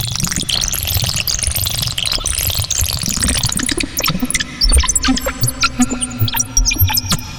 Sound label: water sounds